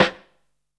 woody, snares; Zero-G; Funky Elements

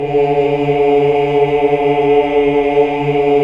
OOH, OOOH Uni; Sound & Vision - Gigapack II CD 1 (Roland)

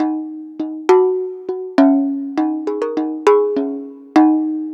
Jug Hi Melody

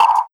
Spitter Snare, Esoterik Beatz; Spitter Kit

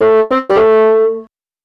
Bass Clarinet, Cinematic Funk; Sonic Foundry (Sony Creative Software); Cinematic Funk Urban Tone Poems

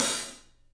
PAISTE HAT, Drums; 3D Sound

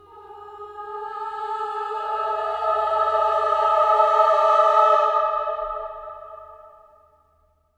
CLUSTER, Voices

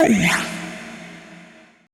FX VOCAL

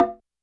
Log Drum; YAMAHA RM1X

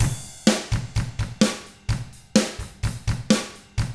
127 SURF ROCK

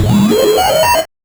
Noise, 8-bit, Bitty Noises

